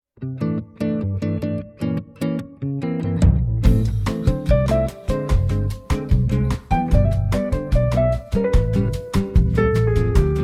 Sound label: bossa nova